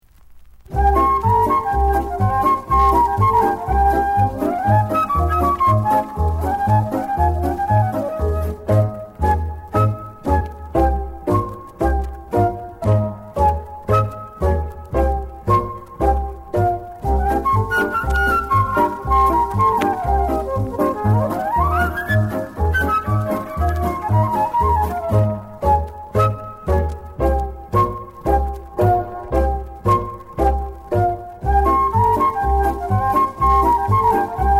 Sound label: Flute in Traffic Jam; 60's Capitol Production Music